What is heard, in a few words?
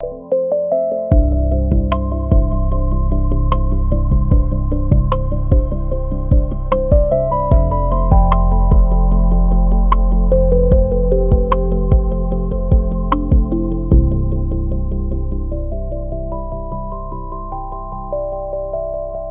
loop